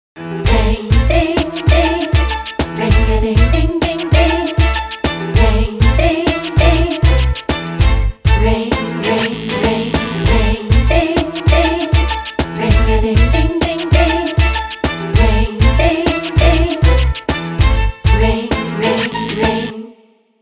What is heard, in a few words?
RingDing, ringtones